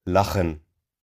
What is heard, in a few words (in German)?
lachen